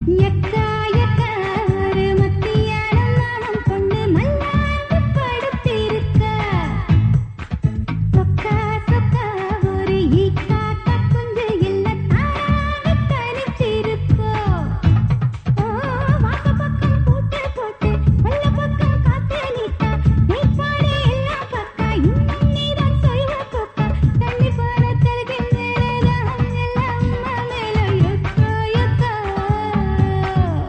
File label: Tamil Song Ringtone; Anantha Poongatre